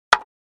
2x4 Impact, hits

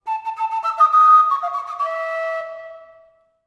Columbian Flute

